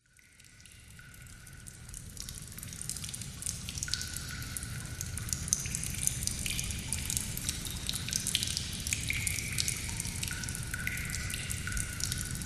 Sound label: nature cave ambience water drips and splatters wind howling outside